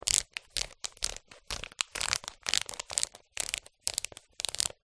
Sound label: rubber band around rolled up news paper movement multiple times; foley